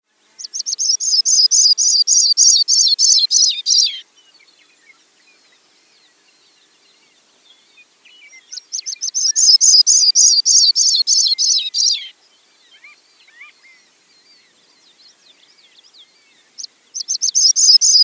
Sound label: Sprague's Pipit